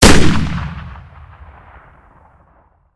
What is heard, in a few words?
fire; tacz; daffas arsenal